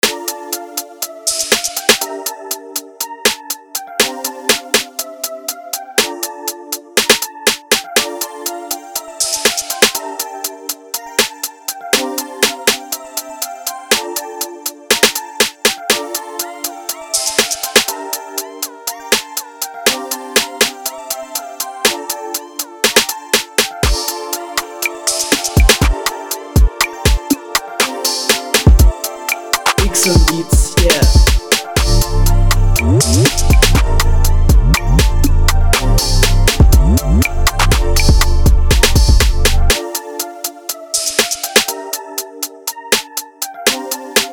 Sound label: beat